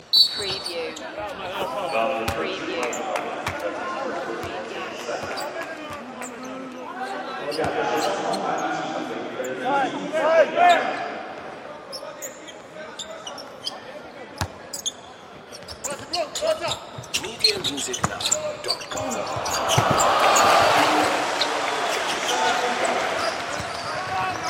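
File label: Arena Basketball Game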